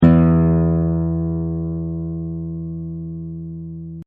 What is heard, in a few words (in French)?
mi grave, accordeur guitare